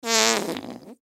Fart Short Wet Squeak; Human; Sound Effects:Human

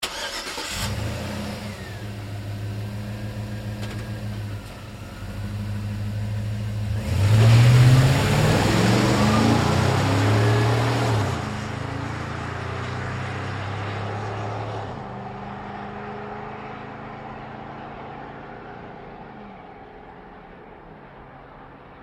Truck GMC Savana Start Away Fast; Vehicles

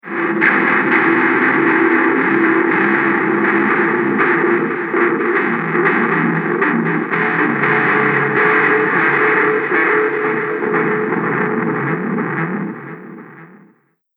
Dungeon Destruction, scary soundscape; Fantasy; Andrew Fleming

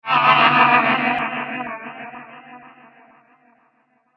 Disturbing Weird Spirit, ghost; Royalty free horror sound effect - excellent sfx for scary videogames, and sinister halloween radio, tv, programmes; Andrew Fleming

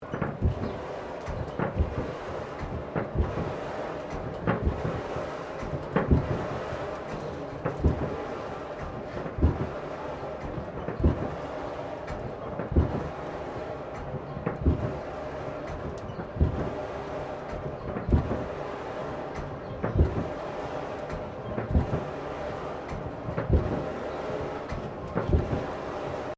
Bellows Blacksmith Operating Loop; Colonial era objects; Machinery

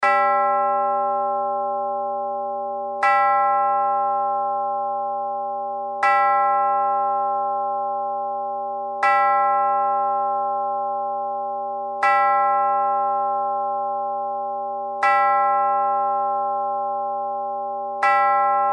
Clock Chime Bell Ten O Clock; Clocks; Machines; Musical; Blastwave

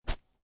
Golf Miss Hit; Sports; Golf miss 3. Fresh air stroke. Miss ball, swipe grass.

